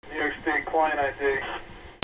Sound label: PHONES